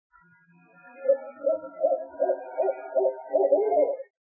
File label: owls